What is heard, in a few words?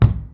foot wood, footsteps; midnight guns